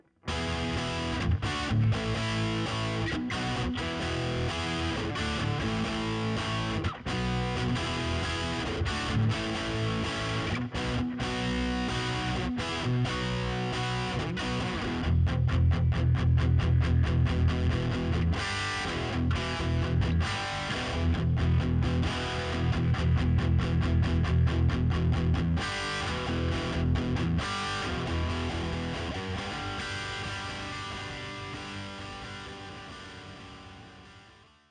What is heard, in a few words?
wicked paf bridge, pickups